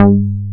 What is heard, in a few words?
G3, J-106 BS; Drums In Your FACE!